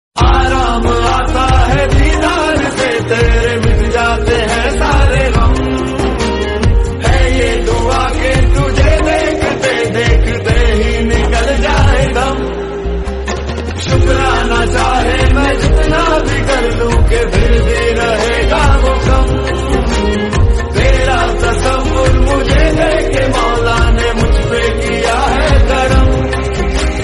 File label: sad hindi